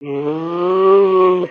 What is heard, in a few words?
Pets Bear Panda Angry; creatures pets; Lego